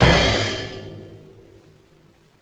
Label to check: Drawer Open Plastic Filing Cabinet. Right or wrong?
Wrong